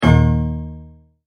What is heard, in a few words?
Multimedia Tone-Stop